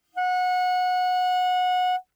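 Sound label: Eb Clarinet fortissimo Gb4; Woodwinds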